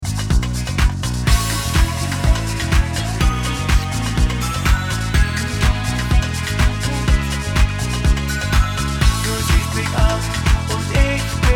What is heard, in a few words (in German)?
Karaoke